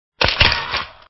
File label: camera